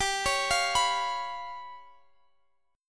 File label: G 7th Flat 5th, Guitar, Standard; x,x,17,18,18,19; arpeggiated; capo=3